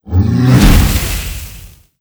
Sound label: boss dragon